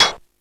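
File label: electronic percussion; East West; Global Sounds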